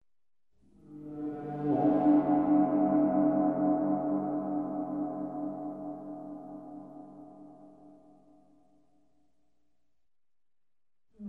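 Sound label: big cosmic gong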